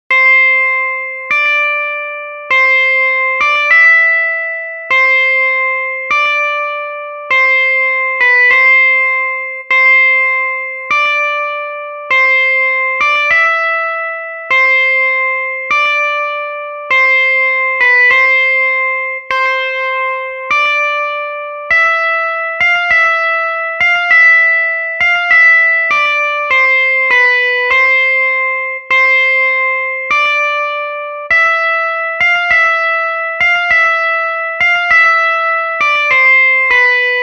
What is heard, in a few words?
Guitar